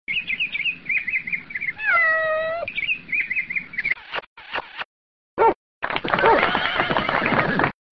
Benachrichtigungstone

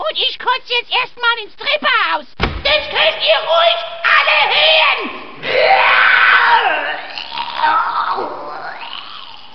treppenhaus

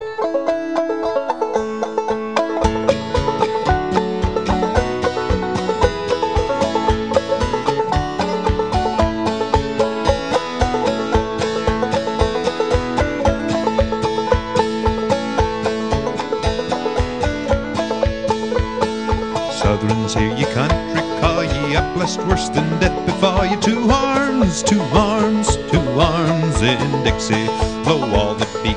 Hymne Suedstaaten

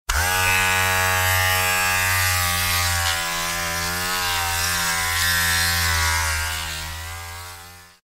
Elektrischer Rasierer

